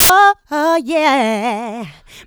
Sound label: vocal house adlibs; PS-28 Vocal House Samples